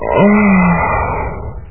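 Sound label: sigh low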